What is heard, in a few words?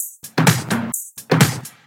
Percussive Loops 128BPM; Vengeance Samples